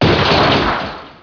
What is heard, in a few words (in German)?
revolverschuss